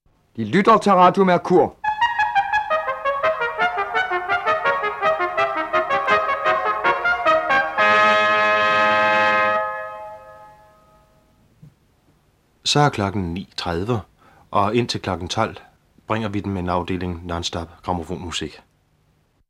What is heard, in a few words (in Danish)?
nonstop musik